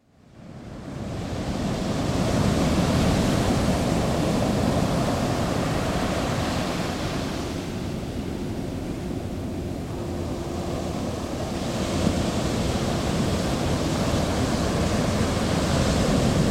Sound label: Ocean Waves Audio